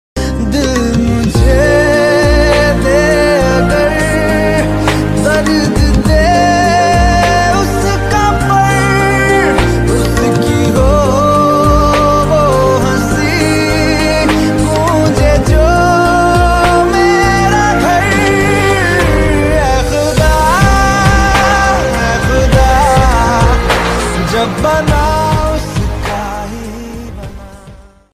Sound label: sad song ringtone